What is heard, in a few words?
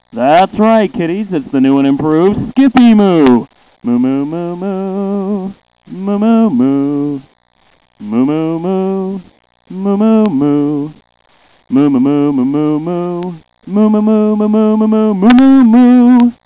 moo